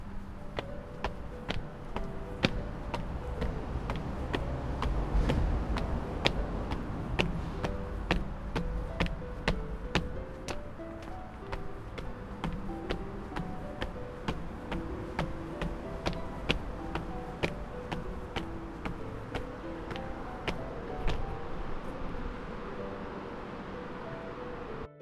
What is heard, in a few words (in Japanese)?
街中 階段